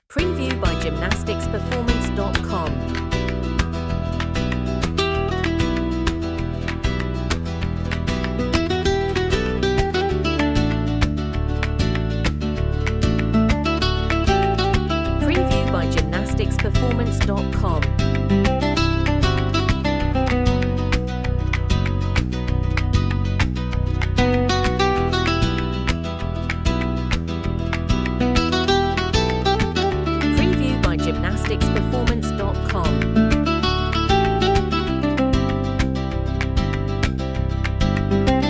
flamenco

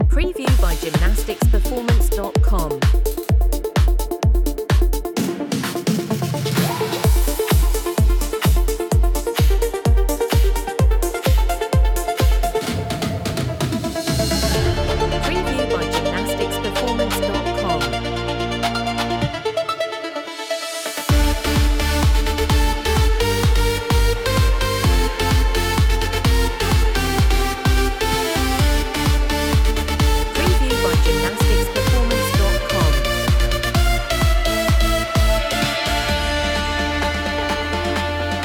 electronic dance music

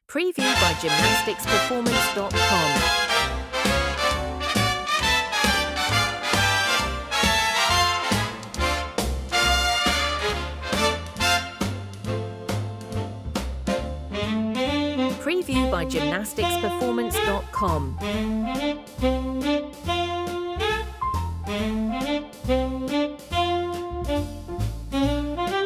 big band

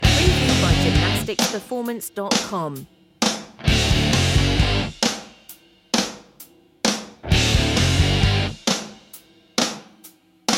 90s grunge rock

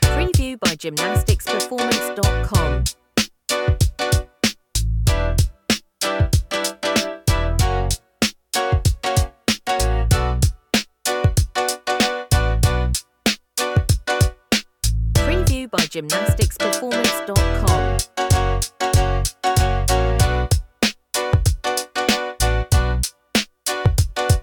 2000s hip hop

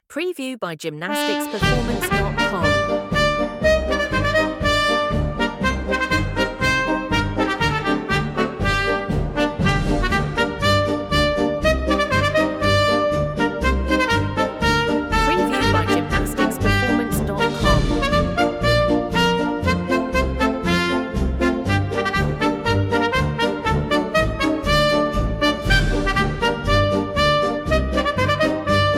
orchestral folk

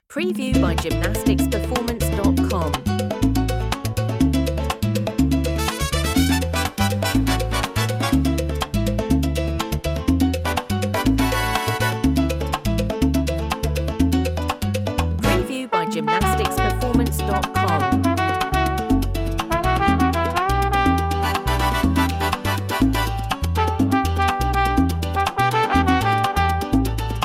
latin salsa

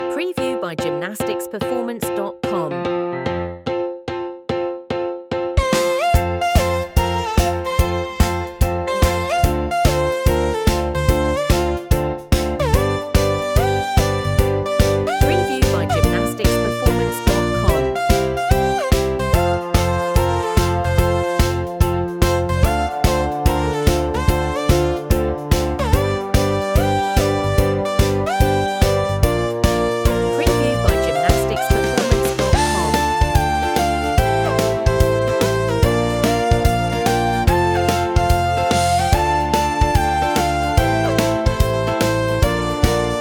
kid pop

